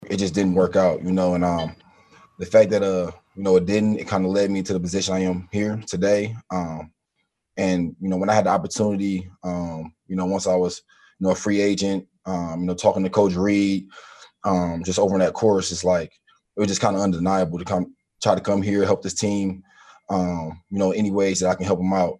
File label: Bell